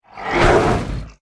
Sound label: bugzard attack